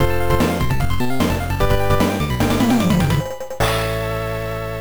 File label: Game Complete; Coryoon Child of Dragon; TurboGrafx-16